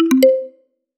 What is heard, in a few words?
Notification